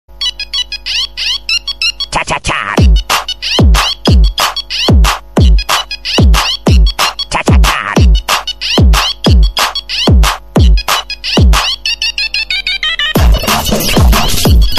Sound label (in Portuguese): toque eletronico tcha tcha tcha